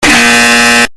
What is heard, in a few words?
extremely loud incorrect buzzer